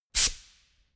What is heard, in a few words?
pneumatic pump quick burst